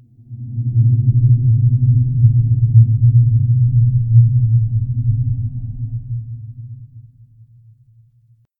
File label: Endworm Attack